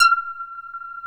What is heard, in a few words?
LEADS; Masterbits; Vintage Voltage